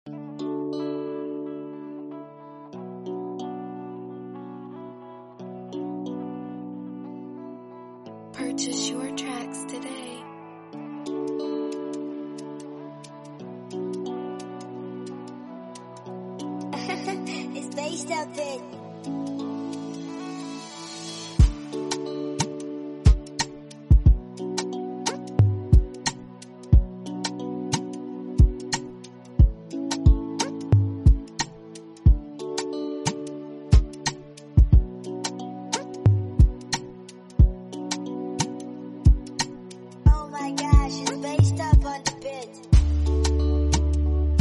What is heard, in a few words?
Afrobeat Instrumental, Emotional